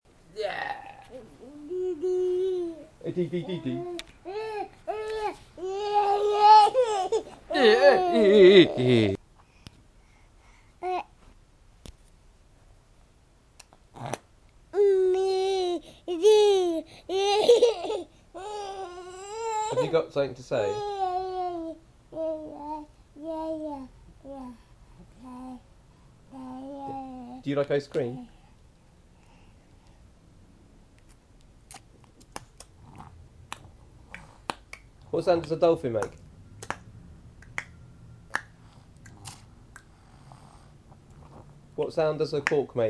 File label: baby noises